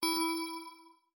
Windows Ding; abbottabad compound

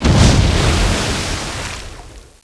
explosion water; abbottabad compound

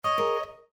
Windows Error; abbottabad compound

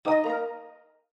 Windows Notify

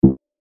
alert; abbottabad compound

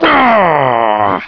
Grunt; abbottabad compound

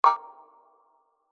disabled Button Click; abbottabad compound

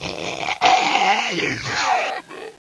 ZOMBIE 8Bit; abbottabad compound

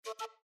Windows User Account Control; abbottabad compound

